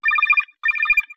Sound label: Garbled Phone Ringer